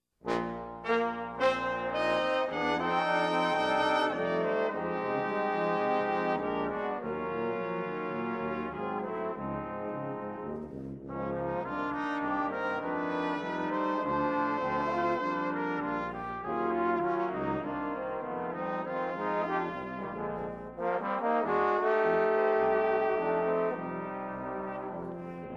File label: brass quintet